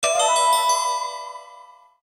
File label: Japanese MMC insert; Nokia Ringtones; Audio themes